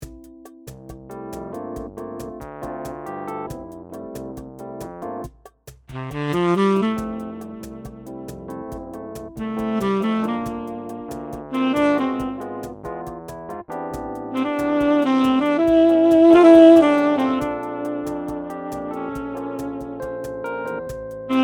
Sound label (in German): YDS-120 Tenorsax mit Rhodes und Drums